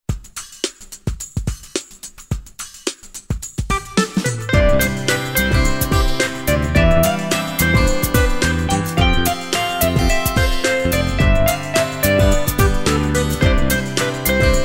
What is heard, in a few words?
bgm